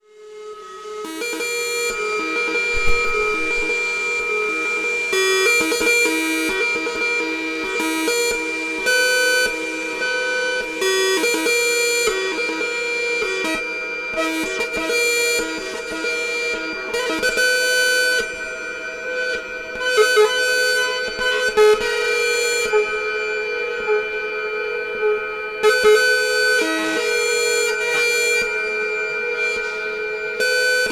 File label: piezo piano